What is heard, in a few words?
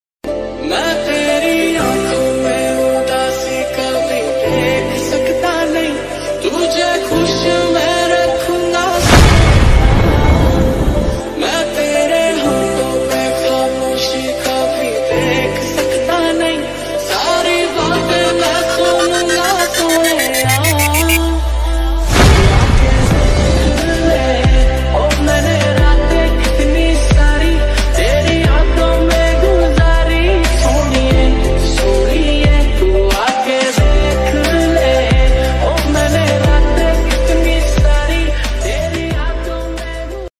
lofi ringtone love ringtone romantic ringtone slowed reverb